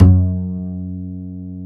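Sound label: double bass F#2, Jazzistic; Univers Sons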